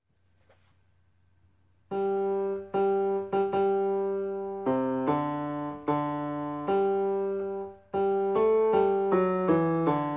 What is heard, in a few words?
bass plonked